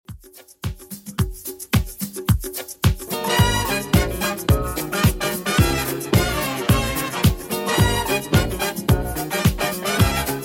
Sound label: 80s Redrum 109 bpm CLEAN